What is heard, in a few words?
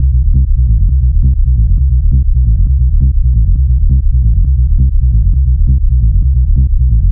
Bass Loop C 135, Techno; Ghosthack; AC2024 - Day 1 - Techno Sounds 2025